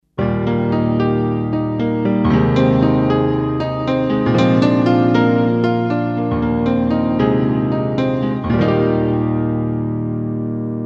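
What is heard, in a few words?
Piano Reverb Stereo Reverb Eb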